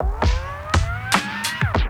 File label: Scratch Tape; FX; Ambient Grooves